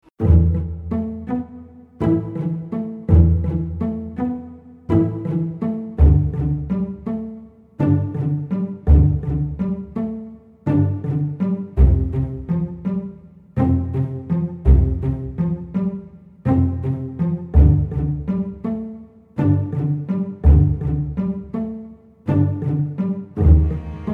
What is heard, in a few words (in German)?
streicher